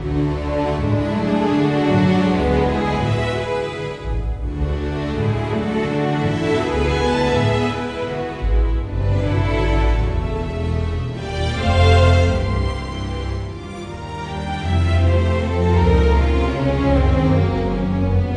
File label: ambience Pay Table; fairyhansel